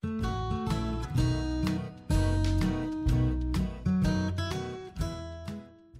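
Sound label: round dance Instrumental Rhumba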